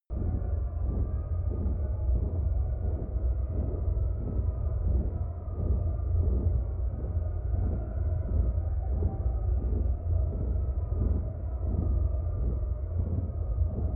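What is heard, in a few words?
infested space station loop, science fiction; atmosphaeren umwelt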